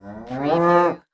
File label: Cow Moo